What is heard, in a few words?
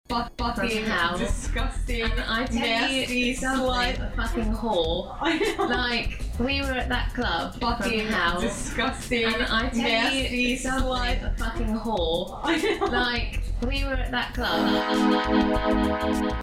isolated vocals